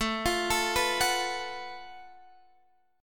Gb Minor Major 7th Double Flat 5th A

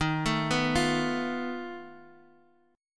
F 7th Flat 5th Eb

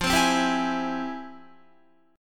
F Minor Major 9th, Guitar, chord; FmM9; strummed; 12,15,12,12,16,16

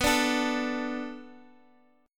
B Minor 7th B, chord

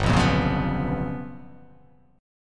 G 7th Sharp 9th Flat 5th

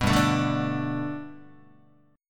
G Major Add 9th Guitar, chord; Gadd9 strummed; JGuitar

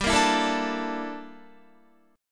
G Minor 6th Add 9th G down Guitar; Gm6add9; strummed

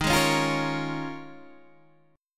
Eb 9th Suspended 4th Eb, Guitar Open D

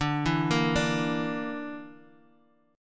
D Suspended 2nd Sharp 5th D, Guitar, Standard; x,5,2,3,3,x; arpeggiated

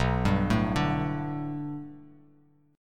B Minor 7th Sharp 5th B, chord; Bm7#5; arpeggiated; 7,10,7,7; JGuitar

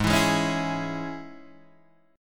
Ab Suspended 4th Sharp 5th Guitar Standard; Absus4#5 strummed; 4,7,6,6,5,4; JGuitar

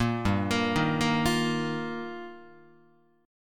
G Minor 7th Sharp 5th, Guitar; Gm7#5; arpeggiated; JGuitar; 1,3,1,1,2,4

